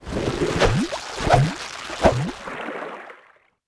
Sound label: TENT SW